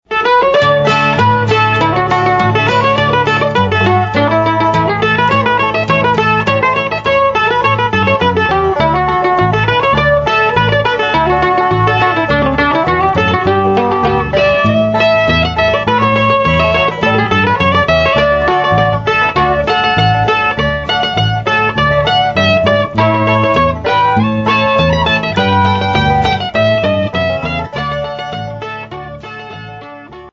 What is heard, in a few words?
Chinese Breakdown, mandolin